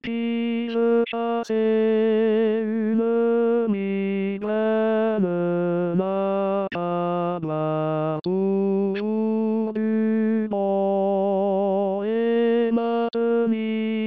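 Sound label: tenor; Bataille; chanson a boire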